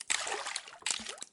penguin ice crack splash; CodenamePenguin

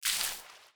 Water Attack; Battle

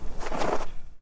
penguin hide in snow; CodenamePenguin

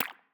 Slime Hit; Battle; Enemies

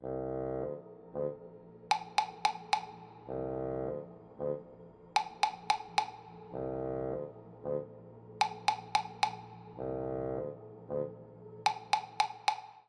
penguin alert music; CodenamePenguin

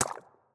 Slime Hit; Battle; Enemies

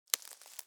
Twig Breaking

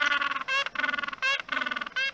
penguin penguin sound; CodenamePenguin

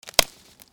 Twig Breaking

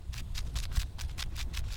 penguin walk alternative; CodenamePenguin

